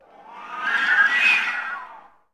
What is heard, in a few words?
FL FX, Orchestra